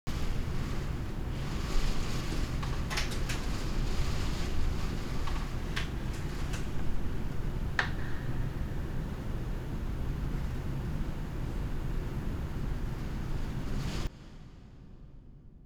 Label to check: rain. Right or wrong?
Right